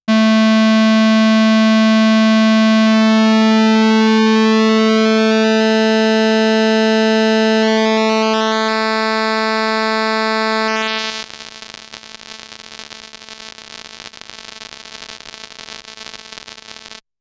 Mopho & Pulse

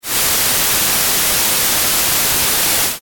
noise wt

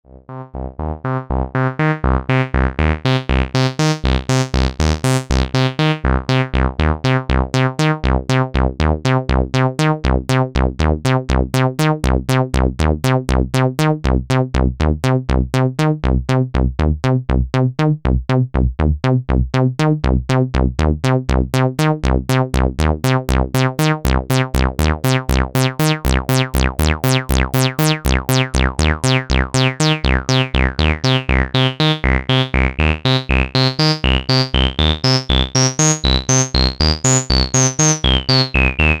svf lp seq

